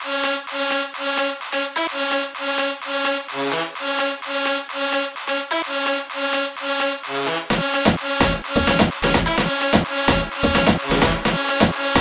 Full Loop Cs

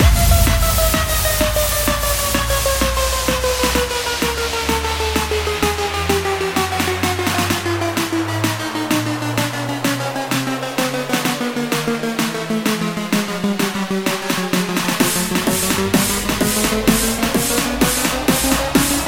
Construction Kit F Loop Full

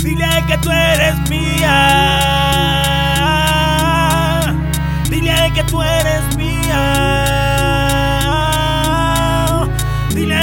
Cs Full Loop 95BPM

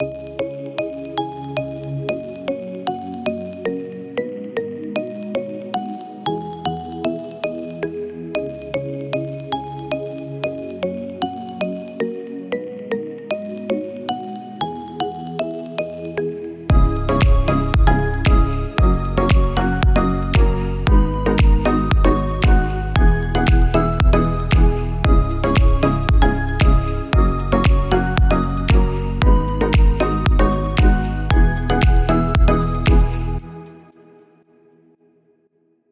Kit Full Loop Fs

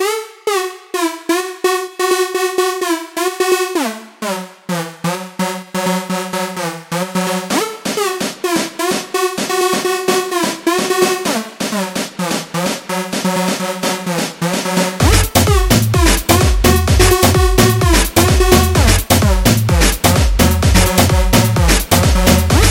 Kit Full Loop Fs

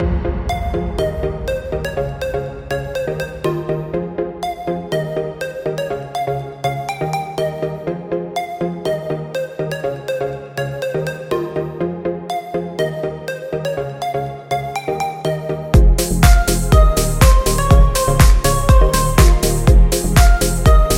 Full Loop F

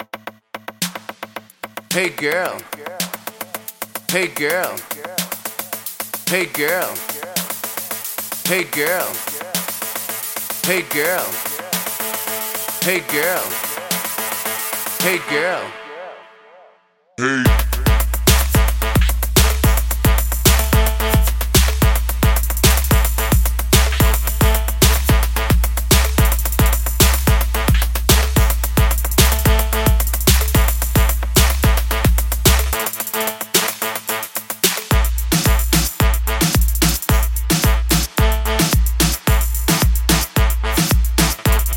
Kit Loop Full

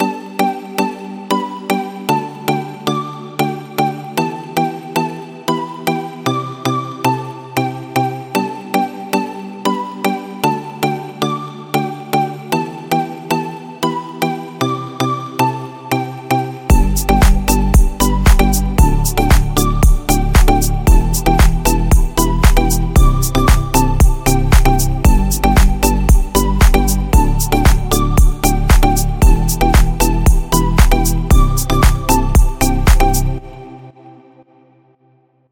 Kit Full Loop G

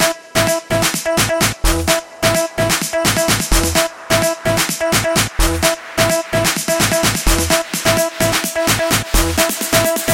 Full Loop E

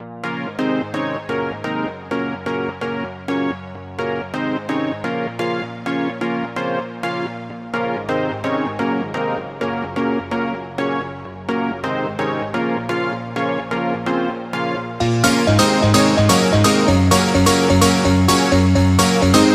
Construction Kit Gs Full Loop

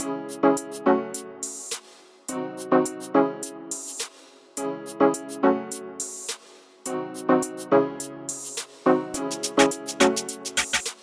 Full Loop

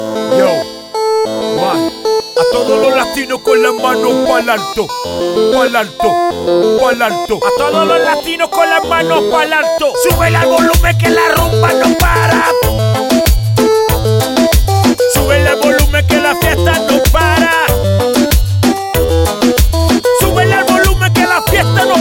Kit A Full Loop 95BPM

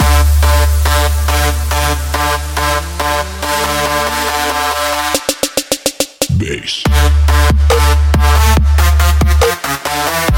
Kit Full Loop

